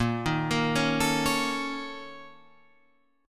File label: A sharp Minor Major 7th Double Flat 5th A sharp